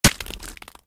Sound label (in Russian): pryamoe popadanie v zhivuyu mishen